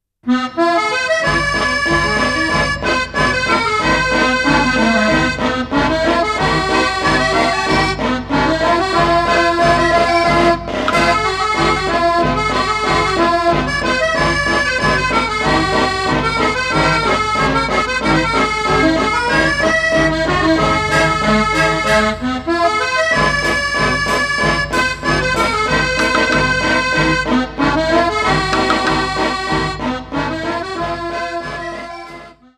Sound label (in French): accordeon